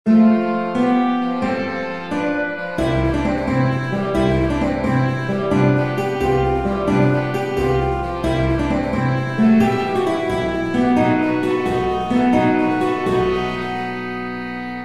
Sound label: jazz combo